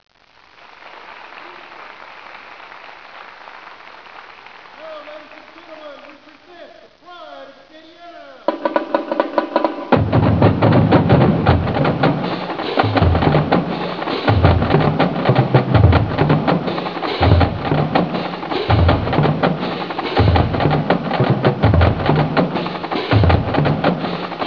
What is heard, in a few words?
Drum corp